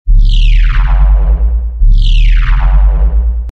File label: Signal